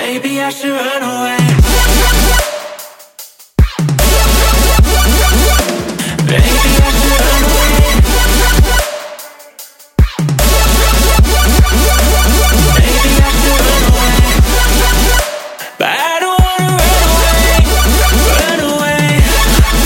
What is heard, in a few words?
trap